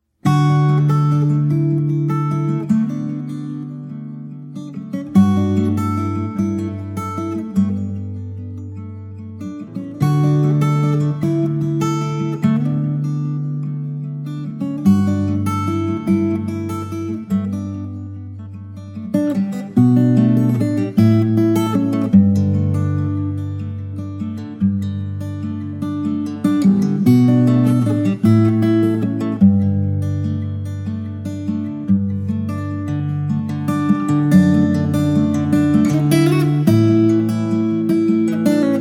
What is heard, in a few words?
folk